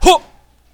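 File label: Jump, female; WarRock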